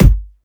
airy bass drum single hit g key, Royality free bass drum sample tuned to the G note